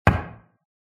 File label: knock; Five Nights at Ronald's